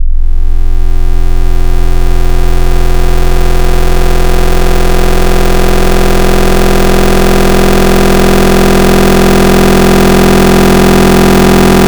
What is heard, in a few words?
Sine To Da Second, FM Wavetables; Cymatics; FM Wavetables Vol 3; Serum